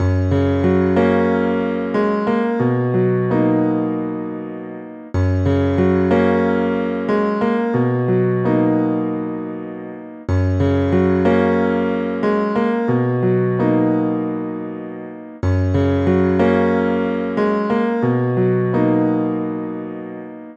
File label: Fantastic Piano